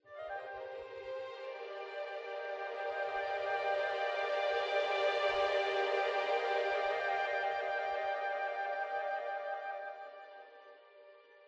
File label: Stinger; Stage 04 Chain Stinger